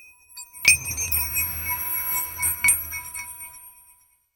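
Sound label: rhythmic celestial scanning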